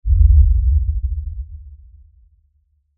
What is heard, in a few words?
Impact Lfe Rumble; Impact Lfe Rumble Short; Low Impacts (Sound Effects:Impacts); Blastwave